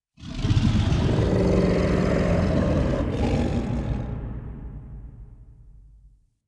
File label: Voruna Idle Agile Rifle; Warframe; Idles Agile